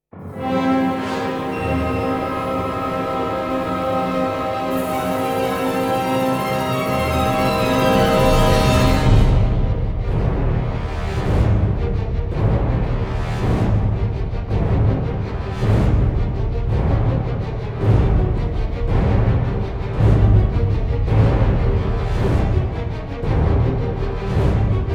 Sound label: No Choir